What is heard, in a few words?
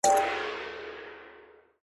Spin Stop Win; slots; Ninpo Slash